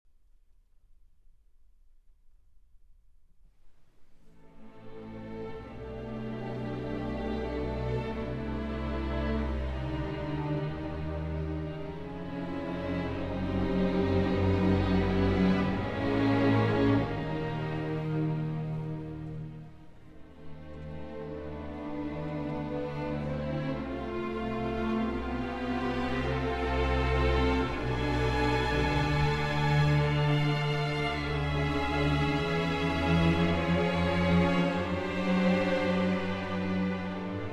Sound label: Cello Concerto in E Flat Major, Moderato, Live